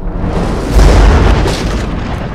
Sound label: thunder